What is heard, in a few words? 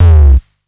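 Distorted Bass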